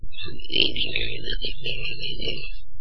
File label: alert; sawer; boss